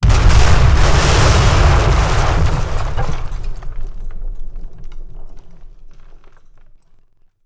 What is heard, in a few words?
bridge blow stereo; WAR